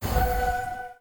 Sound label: Lvlup; ROR2